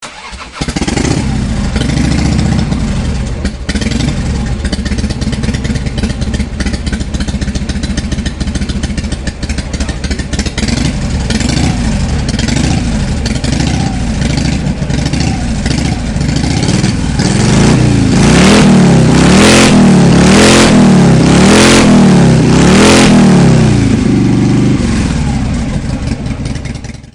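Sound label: engine sound motorcycle Harley Davidson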